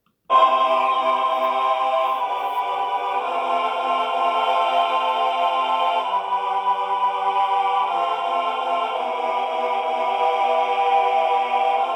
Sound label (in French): choeur